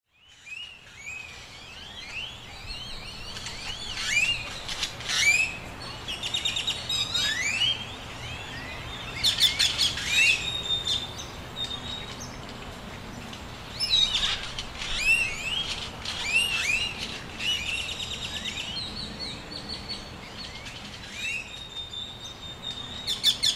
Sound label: interlude birdsong